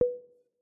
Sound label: Spatial Profile Scan Second Tick; iOS Sounds